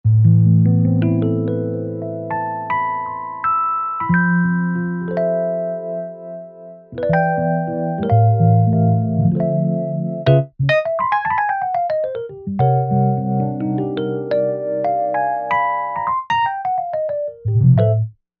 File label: Amped Wurlie